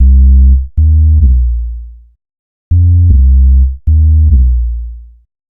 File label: Bass, Futurist Drum & Bass